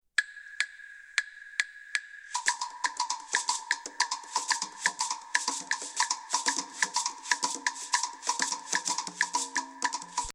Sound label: Rumba